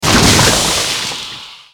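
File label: breath, basilisk; basalisk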